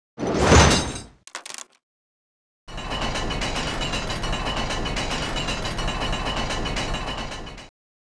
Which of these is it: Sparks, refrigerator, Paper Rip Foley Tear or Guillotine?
Guillotine